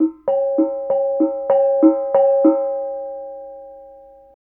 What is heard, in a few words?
KENONG; Spectrasonics; Heart of Asia; KENONG PH